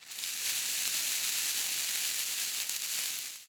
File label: Fuse; openclonk